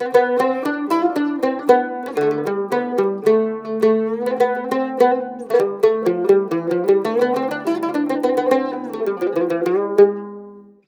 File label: CUMB, CUMBUS, Mediteranean Traditions